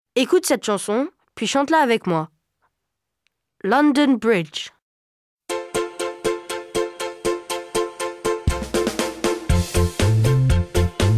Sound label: LONDON BRIDGE IS FALLING DOWN